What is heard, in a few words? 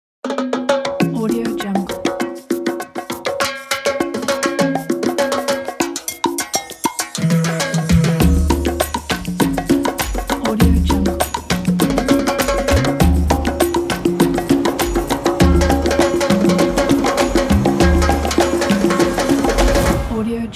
latin percussion logo